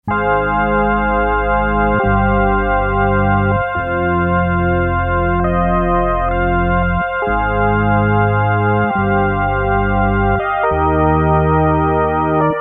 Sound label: RS370, Polyphonic harmonic generator, RS370 Organ; Track 10 'RS370 Organ' Use of the RS390